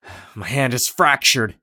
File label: broke hand; Escape from Tarkov